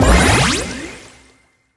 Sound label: Pick Up Bouncy Shield; Subway Surfers City Sound Effects; Android